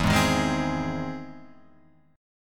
E Augmented Major 7th E down Guitar Open F; strummed; E+M7; JGuitar

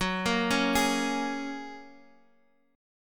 Gb Major Add 9th, down, Guitar, Half Step Down

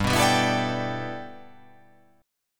G 6th Add 9th G, chord

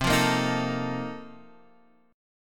A sharp Major 7th Suspended 2nd Suspended 4th

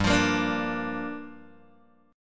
G Minor 7th, Guitar, Open G

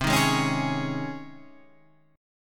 C sharp Major 7th Suspended 2nd, C

